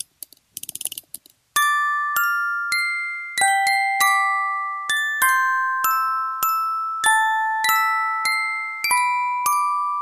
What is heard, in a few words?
Music Box